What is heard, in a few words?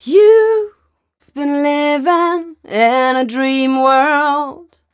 f mel d, fem Vocals